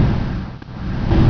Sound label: beacon